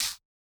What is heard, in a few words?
hit light; battle; CrossCode